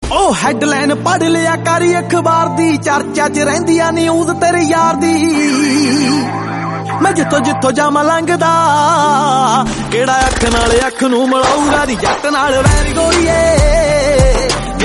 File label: punjabi ringtone